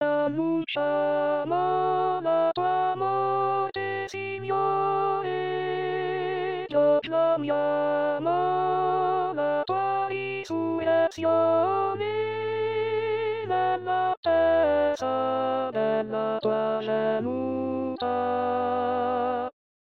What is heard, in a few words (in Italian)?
Contralti